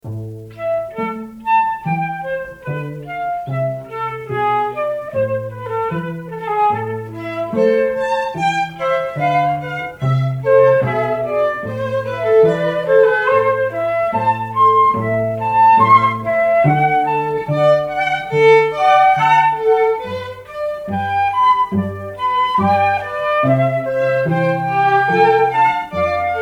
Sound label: Sonate in A minor, Lento